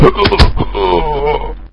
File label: assault die